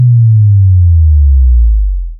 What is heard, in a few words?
slowmo faster